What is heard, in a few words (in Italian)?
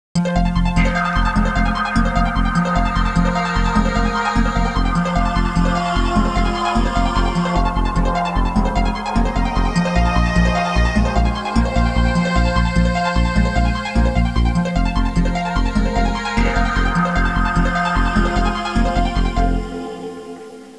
musica elettronica